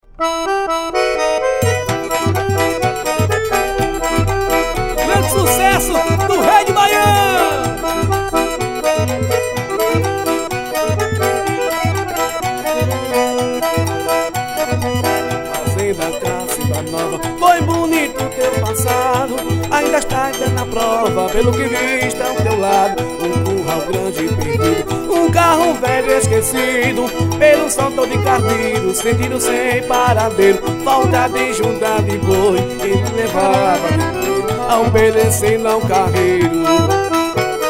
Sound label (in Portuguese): acordeon ao vivo; cacinba nova